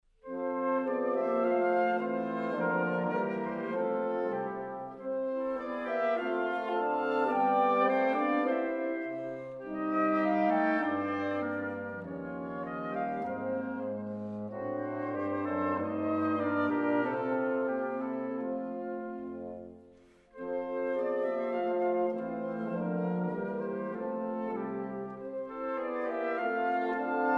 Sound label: sextet; blumer